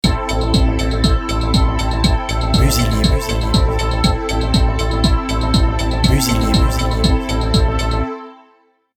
electron, jingles

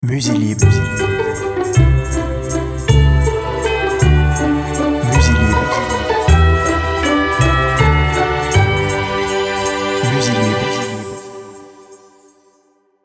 MERRY CHRISTMAS jingle

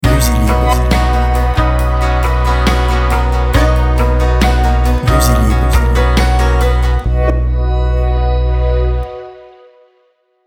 relax, jingles

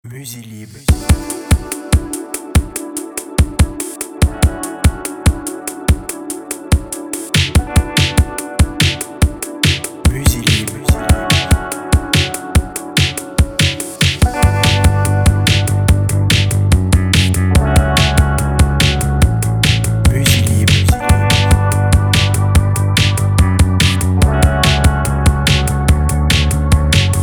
dream tape

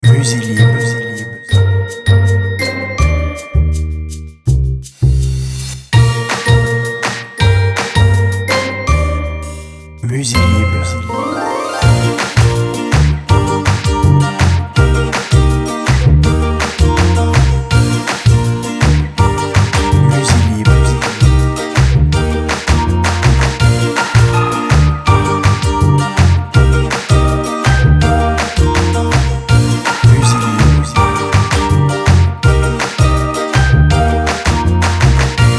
christmas party

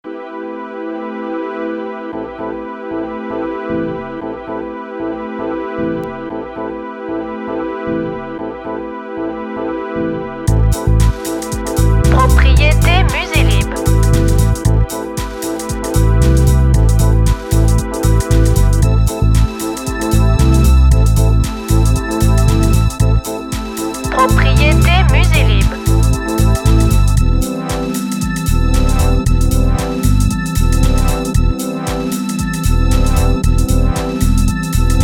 dancing

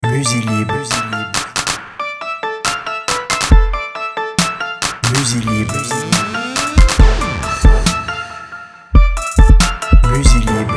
huge trap jingle

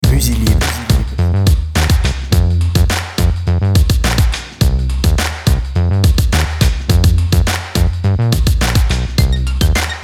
street funk

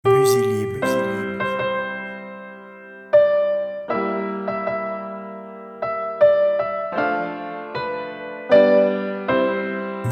oh christmas tree

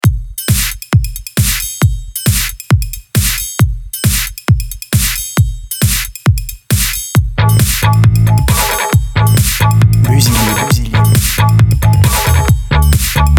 erock

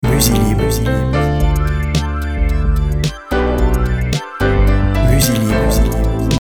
street groove jingle

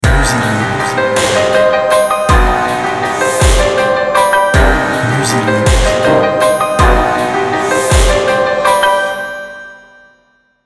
skeleton waltz jingle

